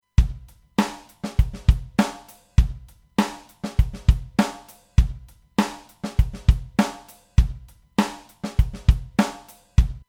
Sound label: Drums Original